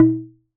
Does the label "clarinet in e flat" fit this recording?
No